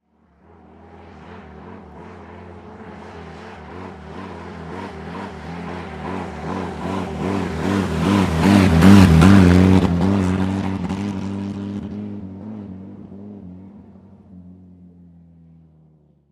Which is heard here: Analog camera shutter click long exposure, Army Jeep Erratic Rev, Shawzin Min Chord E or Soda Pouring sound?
Army Jeep Erratic Rev